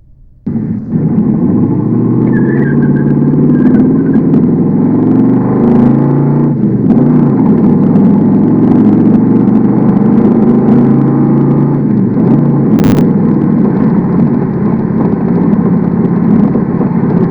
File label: manual peel; land barge